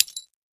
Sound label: Tile Debris Hit with Hammer